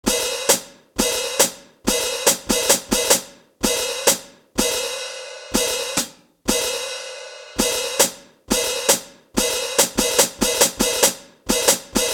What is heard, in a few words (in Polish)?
Hi hat